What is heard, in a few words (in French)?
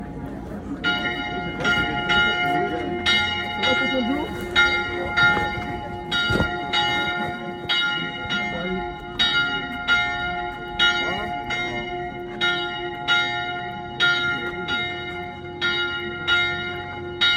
cloche arrivée, EGLISE; LUSSERAY 79; EGLISE SAINT GEORGES